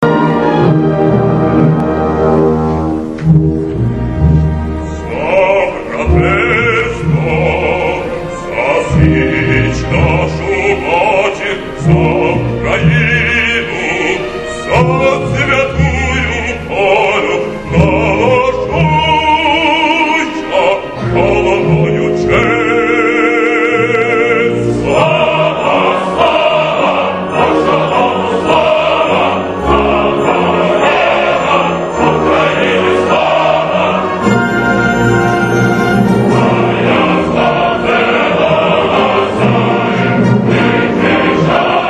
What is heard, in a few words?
opera; Taras Bulba